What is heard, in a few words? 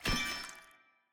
egg drop